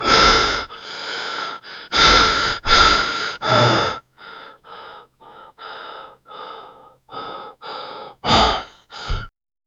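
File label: rapid shallow hyperventilating, panic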